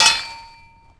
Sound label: grind metal on, terrains; Tony Hawk's Project 8; XBOX